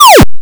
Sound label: Laser shoot